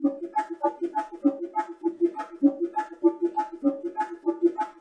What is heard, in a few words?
Bongo